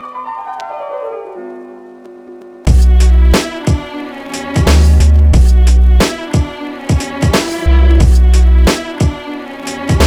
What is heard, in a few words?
instrumental